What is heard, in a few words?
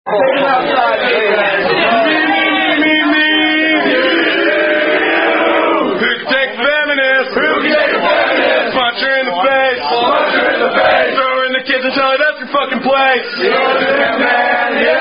rugby drinkup